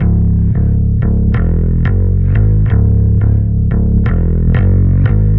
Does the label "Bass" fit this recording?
Yes